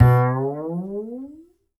Upright Acoustic Bass

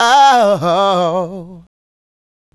Male Solo, Vocal

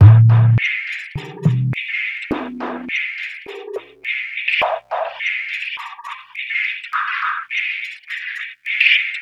Metals Loop; Sonic Foundry (Sony Creative Software); Esoterik Beatz

